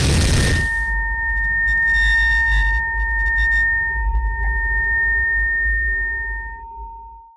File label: geometry dash explosion